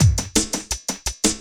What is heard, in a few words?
LOOP, Drum & Bass, 170 BPM; Ueberschall; Drum & Bass Resonance